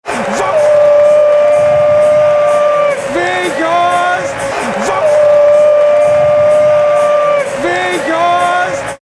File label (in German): klingelton